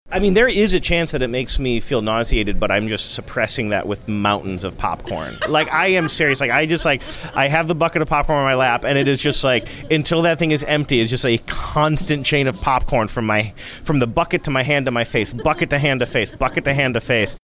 chowing down on popcorn suppress nauseated feeling